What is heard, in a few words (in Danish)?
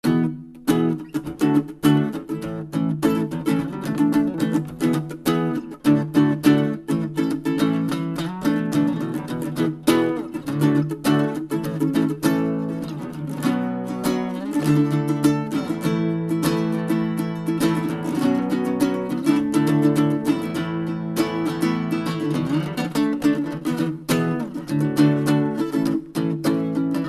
akustisk spansk guitar; Maestro C-70